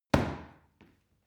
paw kick medium

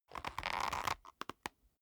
leather creak short soft

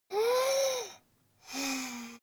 child breathe deep

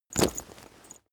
leather metal impact chink

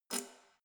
letterbox metal key pull out hard

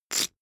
poker chip stack big rattle short

